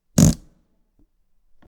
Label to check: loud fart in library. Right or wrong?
Right